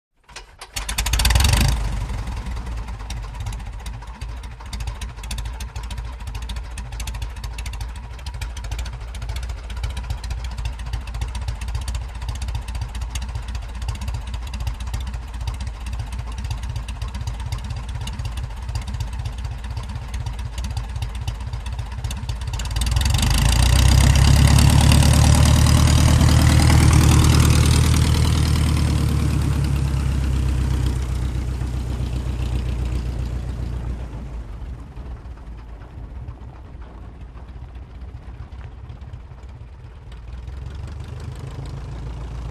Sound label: Rev Up And Taxi, Plane Moves Away With Dirt Grinding Under Wheels. Speed Stays Steady As Plane Pulls Away. Close To Medium Perspective. Prop Plane.; Taylorcraft